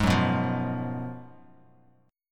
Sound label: Gb Major 7th Suspended 2nd Suspended 4th Bass Standard; GbM7sus2sus4 strummed; JGuitar